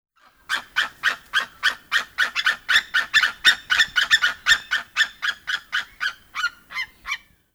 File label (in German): Seeadler Paar Rufreihe